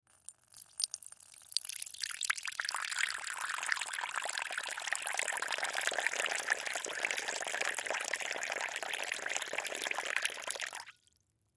Glass Bowl Pour Liquid In; Glass Bowl Small Pour Liquid In; Dishware; Household; Foley; Blastwave

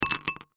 Ice Drop Glass Cup; Household; Food

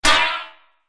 Droid Hit By Shrapnel, robot sound effects; Sci-Fi Robot Sfx

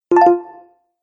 Game Quest Confused, video game

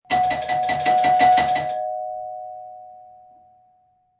Door Bell Fast Repeat, Doorbell ringing; Doorbells; Doors; Doorbell Fast Repeating

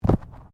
Body movement Fall Heavy

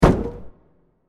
Small Stab With Wood Scratch And Reverb; Burst Sound Effects; Bursts (Sound Effects:Impacts)

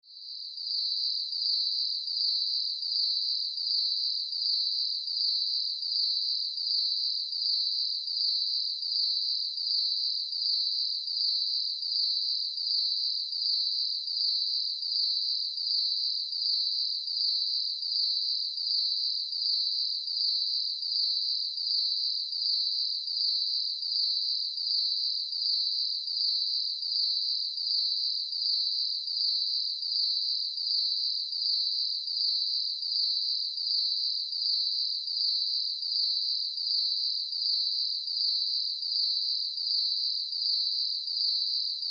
Crickets; Ambience, Crickets, Chirps, Night, Insects, Bugs, Surround Sound

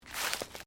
FOOTSTEPS THONGS (FLIPFLOPS) GRASS LEAVES STEP

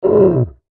Monster Grunt, Horror, Monster, Grunt, Creature; Monsters; BlastWave

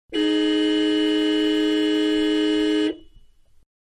Mid Size Car Horn Long Interior; Cars (Sound Effects:Vehicles); Manhattan Production Music

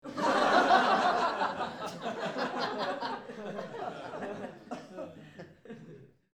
Crowd-Small Group Laugh; Small Crowd , Mixed Group Of Men And Women Laugh

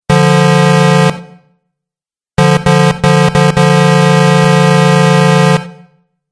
Truck Horn Two Sounds; Truck Sound Effects

